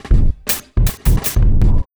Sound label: Kicked Bumpers, Fx; Sonic Foundry (Sony Creative Software); Esoterik Beatz